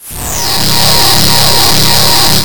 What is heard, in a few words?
mechanical noise